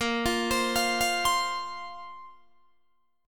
Bb Suspended 2nd Bb down Guitar DADGAD

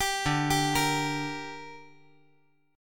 D Suspended 4th Sharp 5th D down, chord

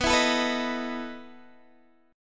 E Major 7th Suspended 4th, B

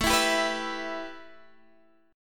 A 7th Suspended 2nd A Guitar Standard, chord; strummed

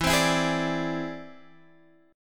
E Augmented 7th E down Guitar Half Step Down; E+7

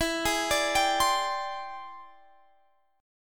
E 7th Flat 13th Sharp 9th

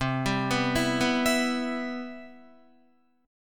C Major 7th, Guitar

